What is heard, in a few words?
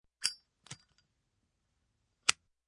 Cigarette Lighter, Zippo cigarette lighter opens, lights, closes; Flicks; Objects